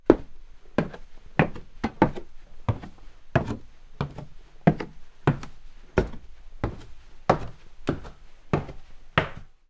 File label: Walking up sturdy wooden stairs